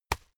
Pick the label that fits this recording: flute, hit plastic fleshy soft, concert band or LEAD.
hit plastic fleshy soft